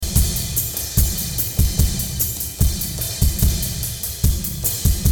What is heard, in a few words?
Piezo Drums; Thremino